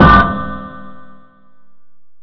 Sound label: metal; hurrican